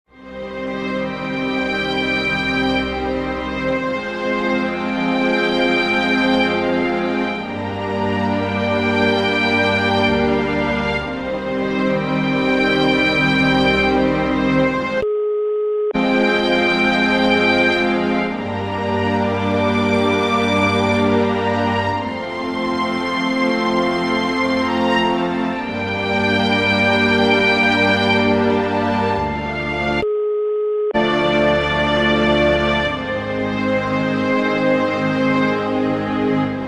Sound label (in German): Musikproduktion - Klassische Musik